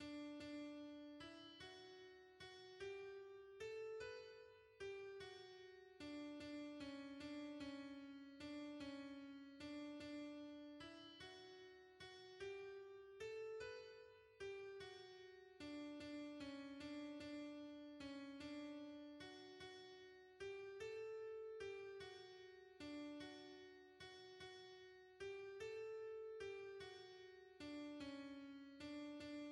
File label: alto; O Dieu supreme est ta grandeur; hymns